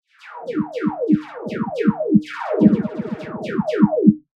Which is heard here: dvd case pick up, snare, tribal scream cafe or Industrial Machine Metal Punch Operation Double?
snare